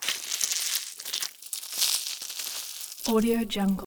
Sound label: splatter splat; soundbits-soundfx